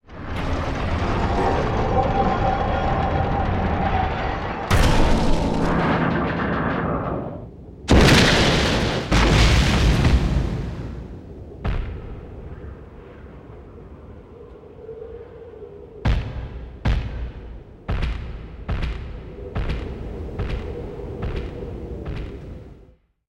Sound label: Evacuation; Ragnarok Odyssey Ace; PS Vita, PS3